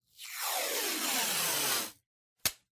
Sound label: Masking Tape Pull Rip